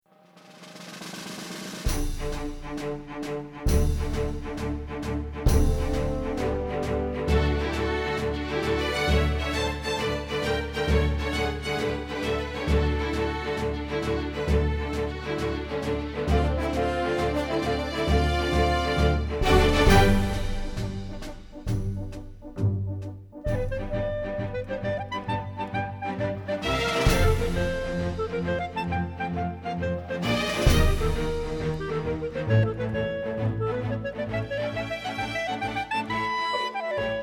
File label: Tarantella